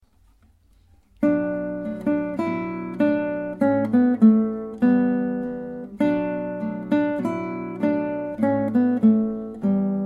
3 gitarren

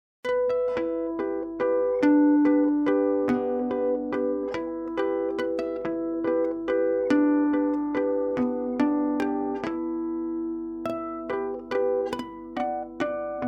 muzykalnaya shkatulka, guitar

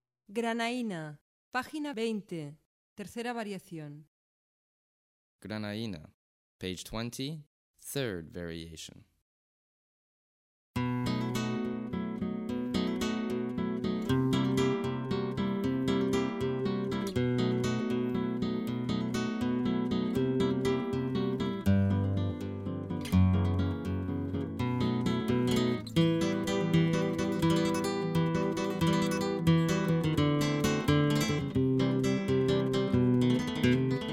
granaina variacion, guitar

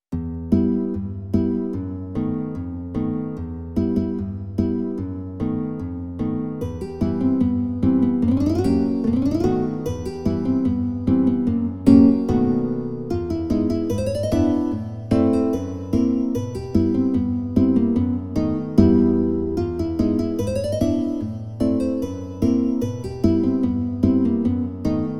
duet

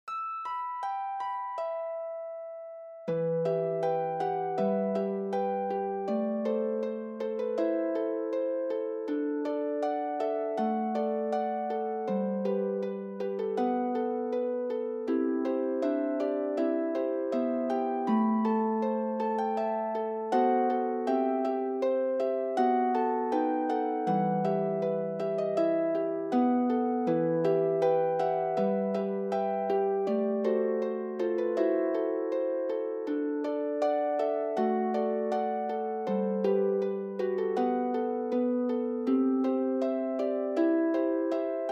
trio, guitar

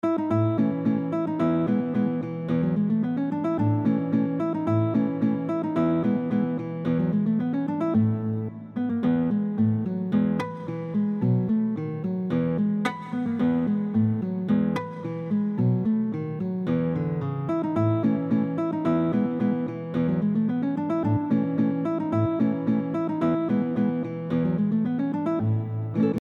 polka, guitar

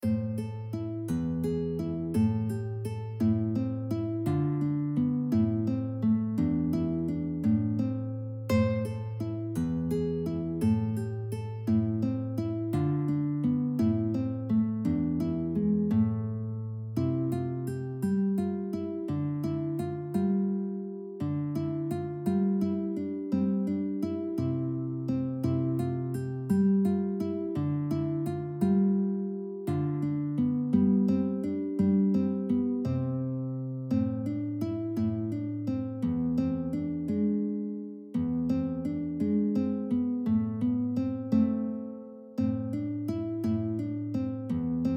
crystal waltz, guitar

